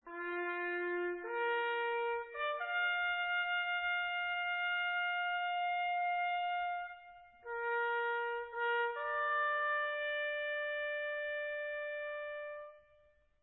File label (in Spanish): silencio, corneta